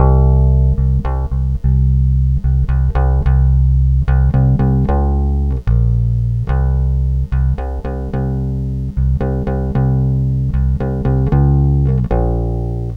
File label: Bass C, Lounge; Zero-G Kocktail Kollection; Construction Kitz (Lounge); Volcano at Sunrise 074